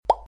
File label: pop ringtone